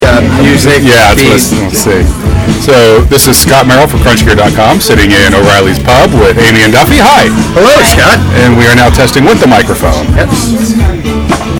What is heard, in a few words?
iphone pub mic